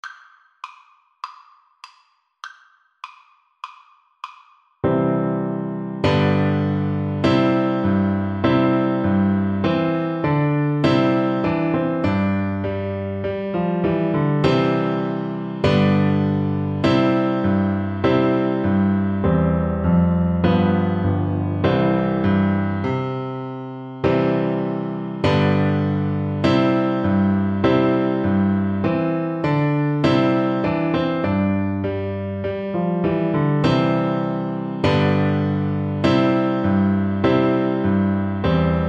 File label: horn, French Horn